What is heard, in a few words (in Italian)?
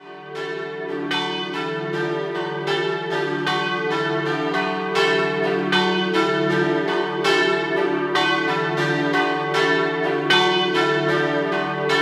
Doppio, campane; Nogaredo Di Corno